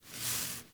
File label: Catbrow Foley Idle Head Down; Warframe